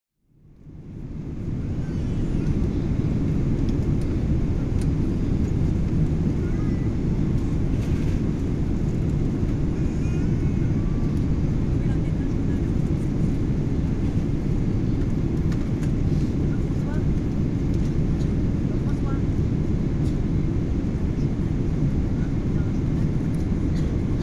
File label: boeing 777 ambience unfiltered; airplane inflight audio recordings